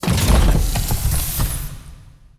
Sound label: Suit Cockpit Open; battle suit aces; Relic